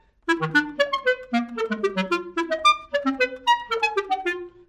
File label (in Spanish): Clarinete